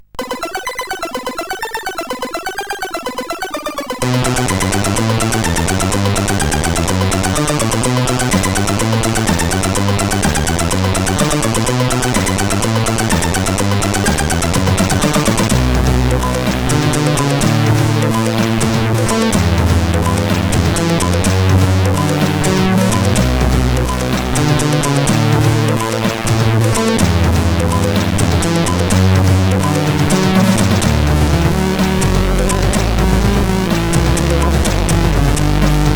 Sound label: sid, CSG8580R5